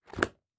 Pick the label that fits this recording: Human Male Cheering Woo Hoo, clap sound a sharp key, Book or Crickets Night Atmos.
Book